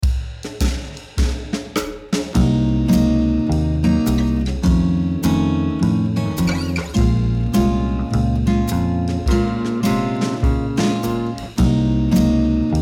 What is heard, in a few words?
two five one B flat minor